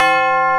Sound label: M TUBULAR; CMP - Analogon; RESO